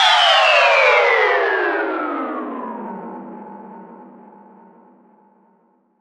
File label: Car Slip; Roland BK-5